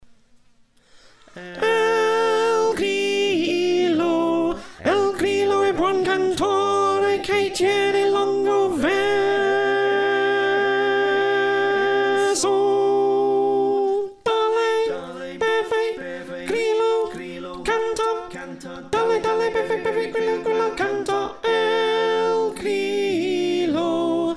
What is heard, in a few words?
Soprano